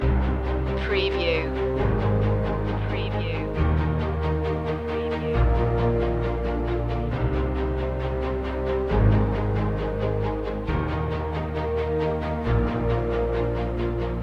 cinematic emotion loop

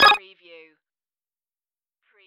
digipanel

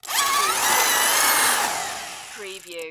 Electric Drill Tight; Sound Effects Bible

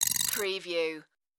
digital slide glitch

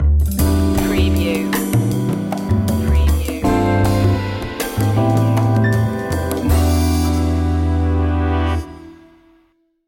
jazzy opener

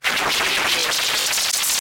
Scifi Production Element - Spleet Goo

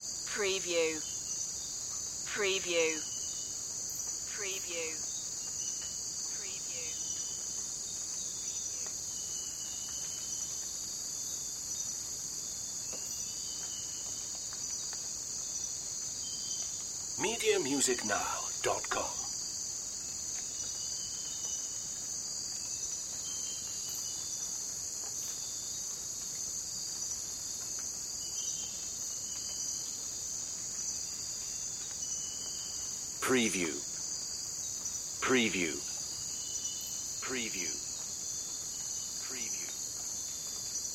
jungle after rain

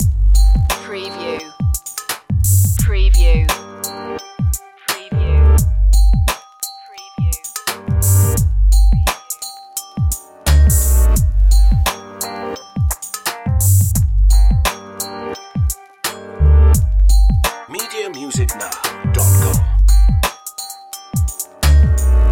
present tense loop

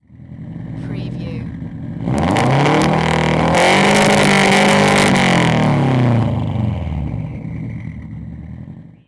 SUBARU STI EXHAUST LONG REV

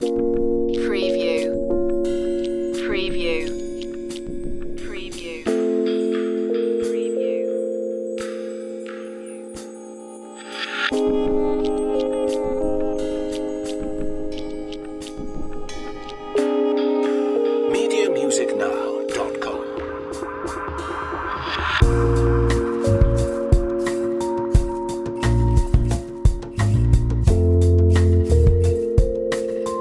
chill zone

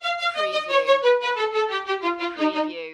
Music Violins Descend

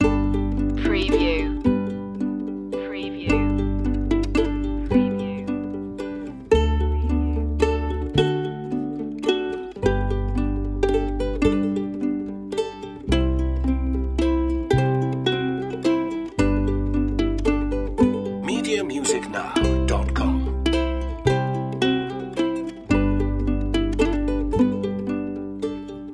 silent night ukulele loop

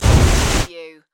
Flame Thrower Burst

